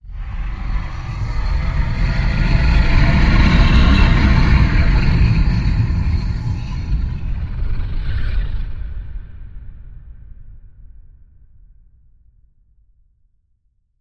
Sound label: Dragons Nest Transition